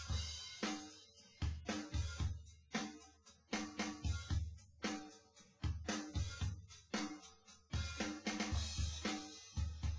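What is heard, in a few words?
test with drums synth conv 250ms 5cm sim; SiSEC10; underdetermined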